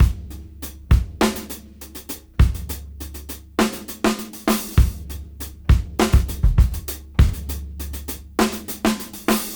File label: Half Funk 101 BPM; AMG - Keith LeBlanc Phat Butt Jams